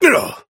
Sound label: pain big; Operative